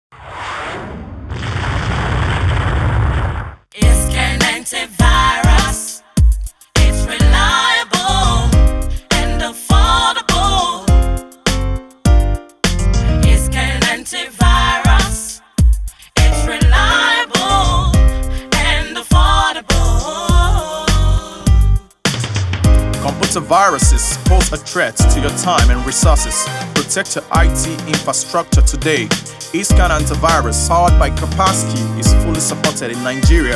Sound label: Jingle; eScan